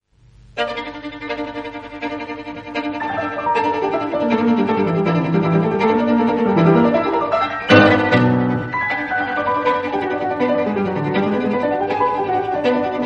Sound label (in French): Sonate violon piano